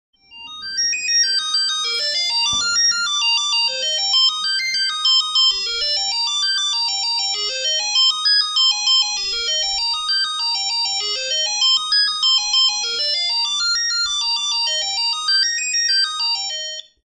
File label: Old Cell Phone fountain noise